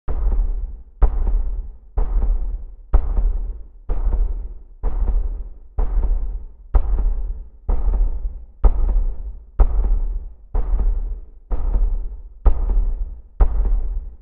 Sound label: Intro Kick